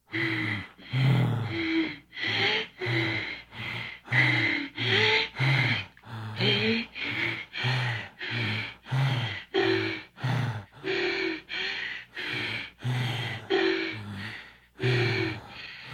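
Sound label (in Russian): Сексом занимаются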